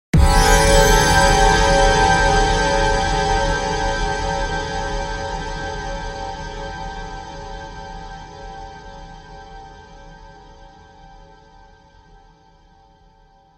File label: Jump Scare; Vacuulus